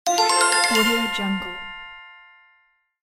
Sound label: winning; medusamusiclab